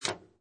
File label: Pinball Sound Mechanical Clank; Video Games